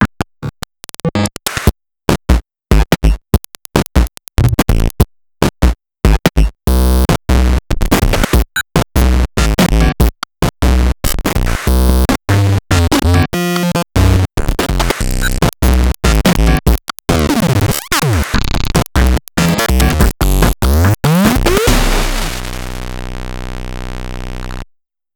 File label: noizzz percussive